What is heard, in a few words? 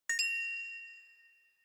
Inspiration Low Reverb